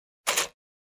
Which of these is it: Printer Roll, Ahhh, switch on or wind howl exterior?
Printer Roll